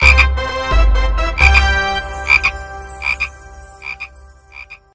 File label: Crickets Celebration Frog